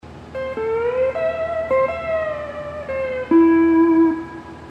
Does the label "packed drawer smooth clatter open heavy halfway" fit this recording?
No